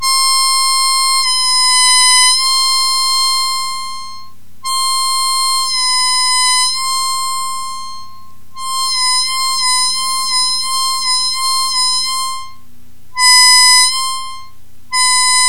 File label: bending, harmonica